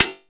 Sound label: SD, 170 BPM, Drum & Bass; Ueberschall; Drum & Bass Flash Trackz